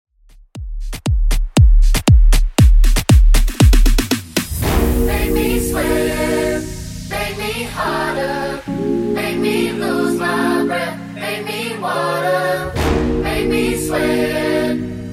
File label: Moombahton 118 bpm